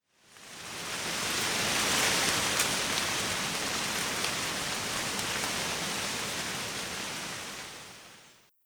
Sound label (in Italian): brezza e foglie; Inquisitor 3